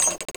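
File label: Gate, pinball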